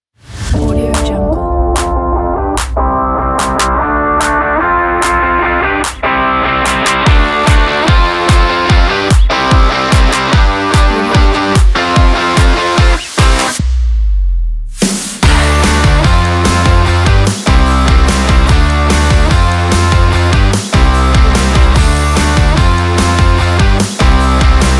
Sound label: energetic spring pop rock